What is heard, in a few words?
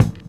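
bass drum 70s, drum kit; E-Lab; X Static Goldmine 2; akai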